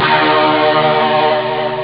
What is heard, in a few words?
pop guitare; ST-XX Amiga Soundtracker Sample Packs